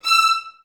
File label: VI E5, Advanced Orchestra; Best Service